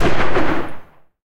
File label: 12mm tails close, guns tanks; War Thunder